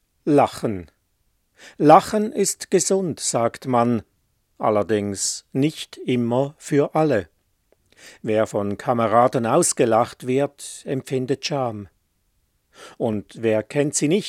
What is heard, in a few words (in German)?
Lachen